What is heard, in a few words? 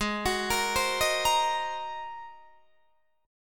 G sharp 9th, Guitar, Open D